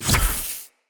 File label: Soul Ability Removed